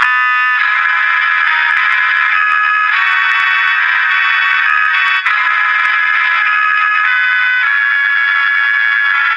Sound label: auld